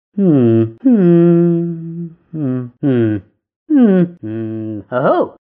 "hmm"

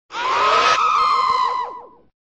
scream

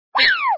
yay; toad

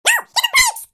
train brakes

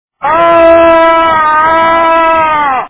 fox ahh

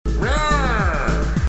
myah

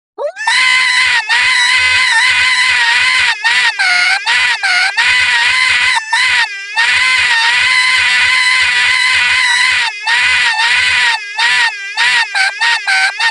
mario scream

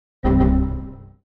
error; cave

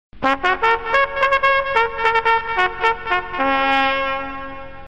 trumpet

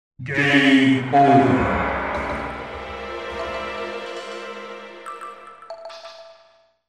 game over; cave

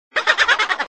boo

